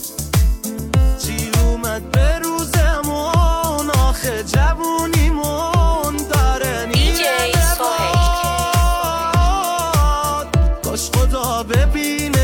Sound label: music sad remix; آهنگ غمگین گریه آور مخصوص اینستا